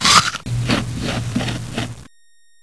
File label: player death; ßpeede DEMO; Arcade